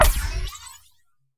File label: Bio Gun Shot